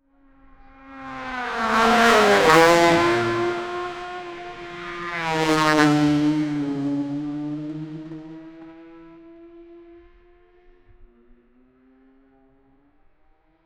suv cars racing

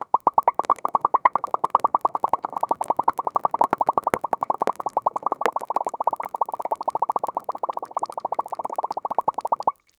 science lab bubbles; laboratory; think

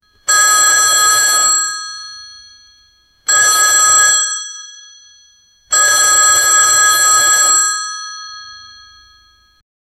old telephone ring

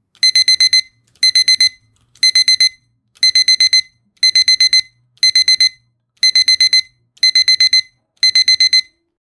annoying piercing alarm; devices

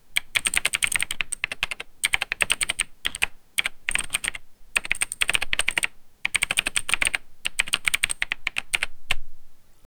computer keyboard sound

